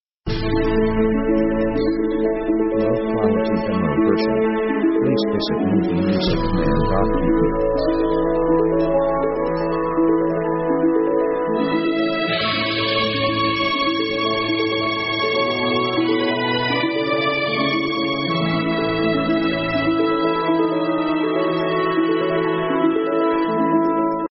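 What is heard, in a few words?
orchestra friendly progress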